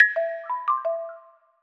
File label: Marimba; musicradar; cartoon caper